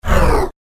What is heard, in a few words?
Sci Fi Power Down Time; Science Fiction Sound Effects